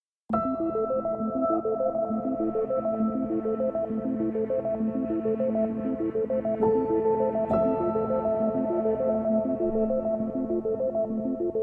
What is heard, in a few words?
A mysterious, cinematic neo-classical piece